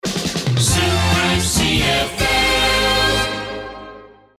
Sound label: Shotgun Drum